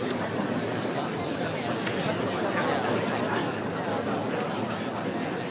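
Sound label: crowd discuss outside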